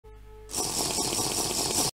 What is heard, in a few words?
Drinking water; Minecraft